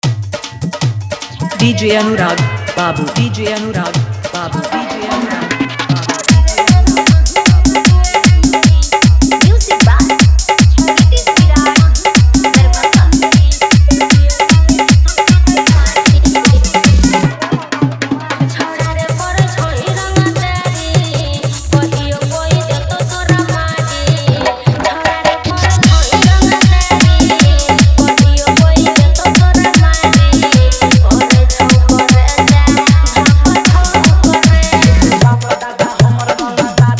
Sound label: Fully Dhollki Bass